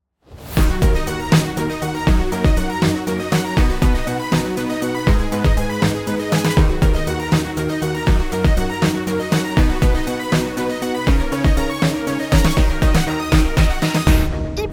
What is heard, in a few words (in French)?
identite sonore; ipropulse